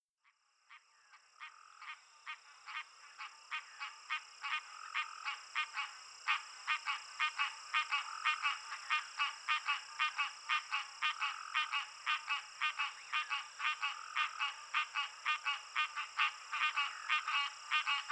Southern Leopard Frog, Pine Barrens Treefrog, Pauraque, Insects